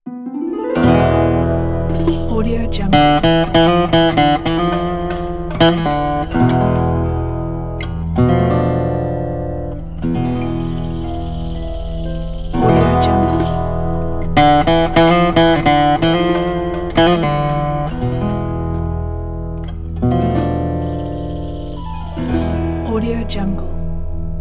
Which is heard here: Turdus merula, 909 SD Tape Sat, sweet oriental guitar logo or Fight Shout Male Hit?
sweet oriental guitar logo